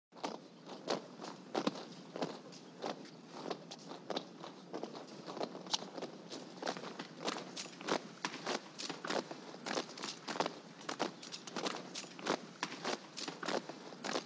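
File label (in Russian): солдаты маршируют марш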